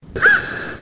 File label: caw, crow; Votive 1.21.1; VotiveItemsAdder; votive crops